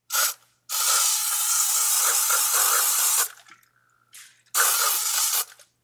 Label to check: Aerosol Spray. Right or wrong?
Right